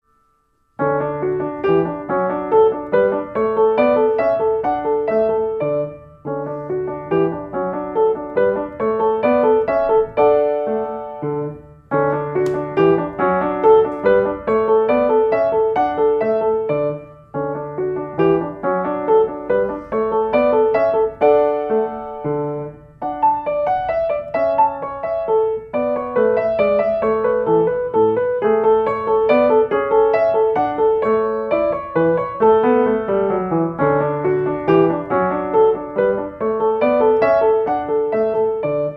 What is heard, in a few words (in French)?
menuet